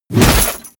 armor body fall

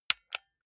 console controller xbox rt press

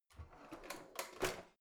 old drawer creaky out

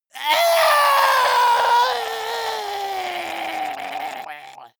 monster death scream high

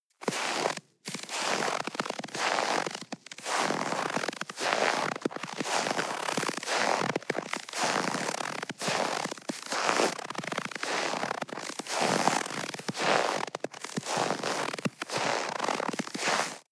step snow walk slow

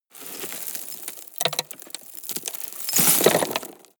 drop rock debris hard

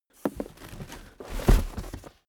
cardboard box big lift handle

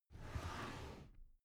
drawer cabinet carton open soft halfway

